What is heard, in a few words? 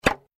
reel land; Stampede Call of the Pride; Eyecon